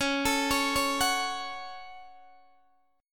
Db Suspended 4th Sharp 5th Db, Guitar, Open D

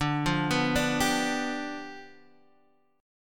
G 7th D

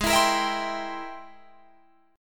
G sharp Augmented Major 9th G sharp Guitar Drop D

